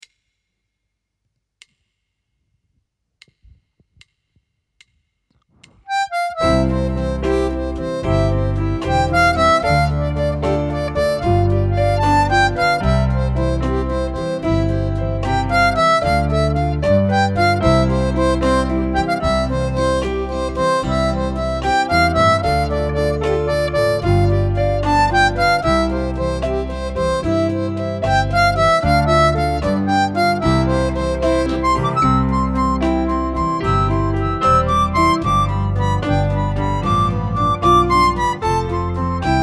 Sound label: Irish Washerwoman 75 harp